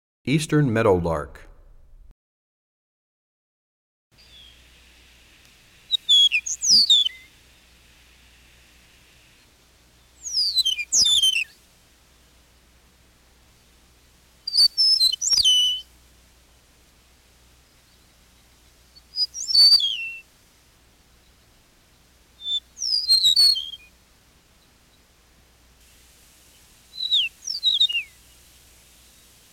Eastern Meadowlark, Bird Songs; 101 Bird Songs

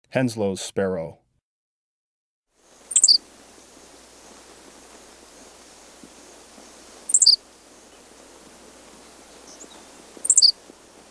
Henslow's Sparrow

